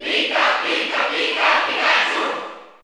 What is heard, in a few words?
Cheer German; Pikachu; SSBU